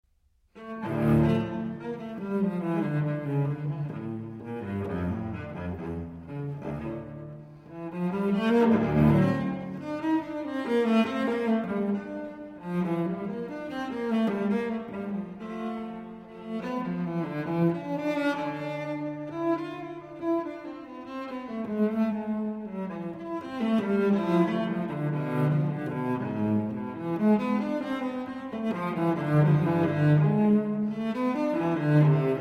Unaccompanied Cello Suite No. 2 in D Minor Allemande; BWV 1008